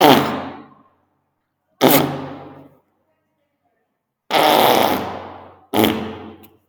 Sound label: FARTS; memes